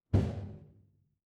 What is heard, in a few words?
transition braam